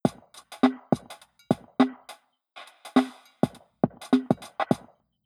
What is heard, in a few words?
V shape drum conv